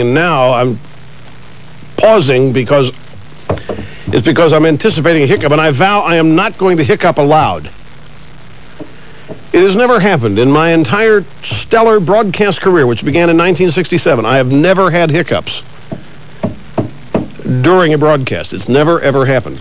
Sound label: hicup